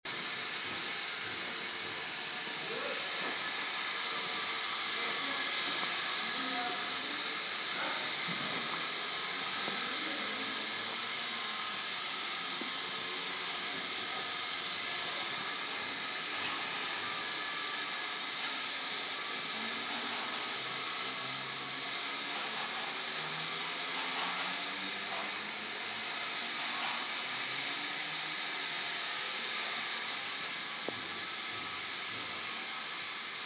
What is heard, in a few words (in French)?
chantier